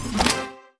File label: dropping of other cards; ringtone; Mini Militia